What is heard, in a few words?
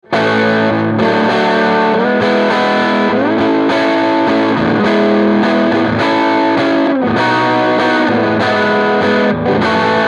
crunch